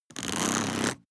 plastic mechanical wheel rattle

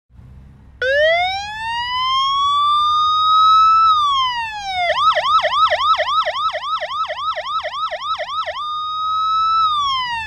police motorcycle siren

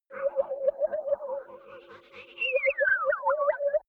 crazy science lab

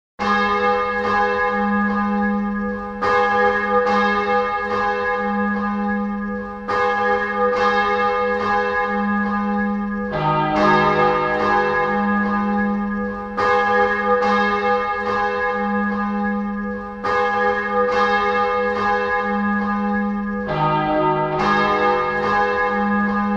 church bells

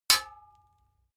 sword metal hit ring

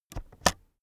keyboard external space press slow

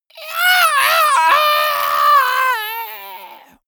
monster death scream high

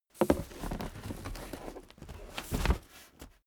cardboard box big lift handle

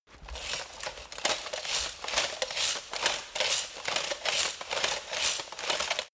light walk fast sequence

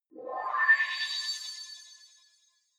sci-fi transmission incoming

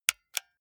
console controller xbox rt press

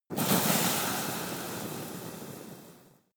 volcano oneshot lava sizzle

